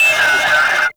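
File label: Military Backpack Shutdown; Objects; Storage Container; Warframe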